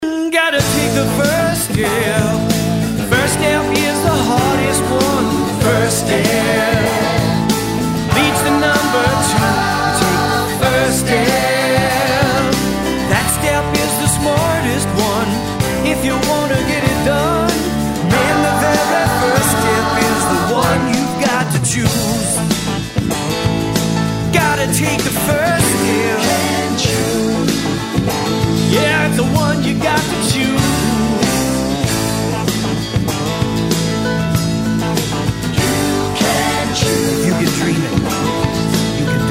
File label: Pop Rock